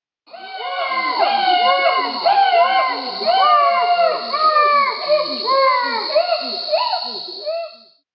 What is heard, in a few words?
chimpanzee; sounds of the wild